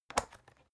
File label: folder close